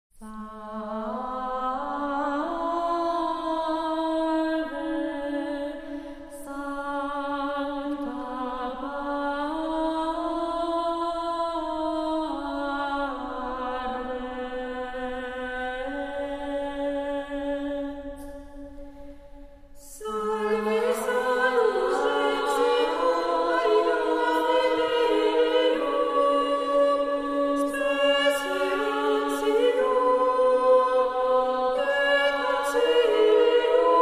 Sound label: Motet